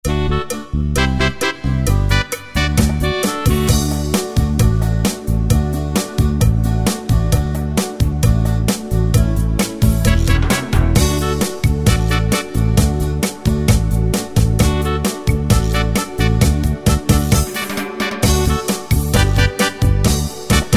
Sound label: Cha Cha, Yamaha QY70 Preset Styles